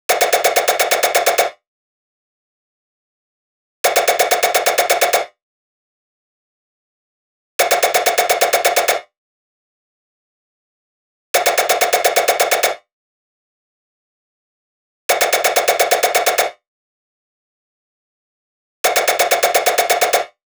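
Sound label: 128BPM Drop Lead dry NoSC, Synth, F minor; Vengeance Essential Clubsounds Vol.5; VEC5 Demo Constr Kits; Mainstage Kit; Root Fmin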